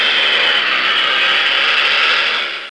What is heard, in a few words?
saw; Bible Fun Pack; NOAH